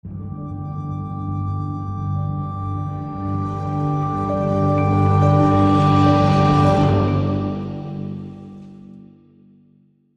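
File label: Magical Crescendo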